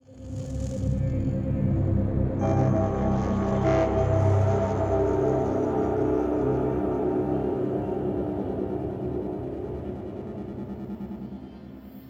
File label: Downzone Stringer Ambience; Syndicate